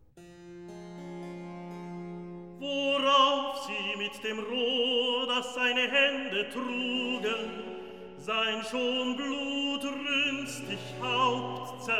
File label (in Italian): Recitativo; Worauf sie mi dem Rohr; Handel; Brockes Passion